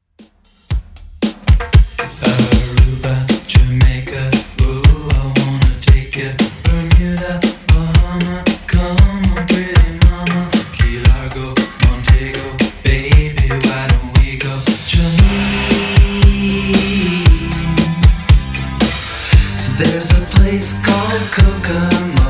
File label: 80s Redrum 116 bpm